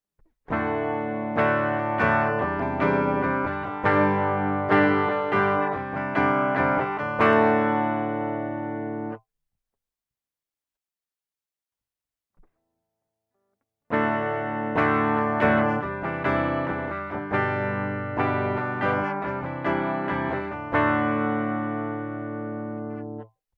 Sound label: guitar direct V direct out